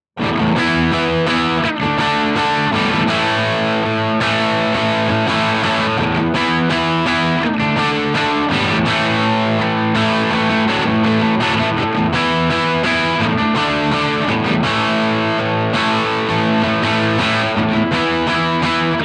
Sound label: crunch; jensentone